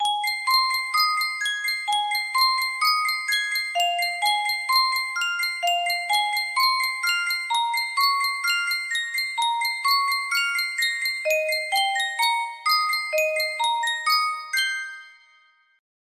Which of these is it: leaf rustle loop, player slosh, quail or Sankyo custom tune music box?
Sankyo custom tune music box